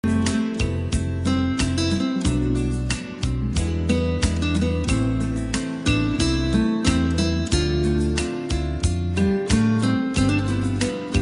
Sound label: romantic guitar love story